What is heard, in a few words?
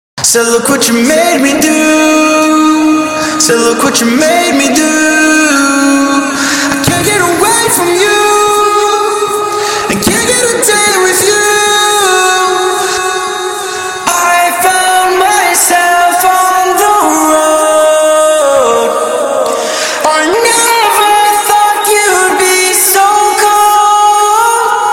rnb 150 Ebm, Acapellas